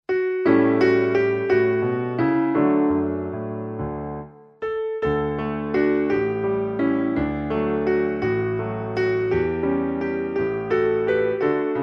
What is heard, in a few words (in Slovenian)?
zvočni posnetek INSTRUMENTALNO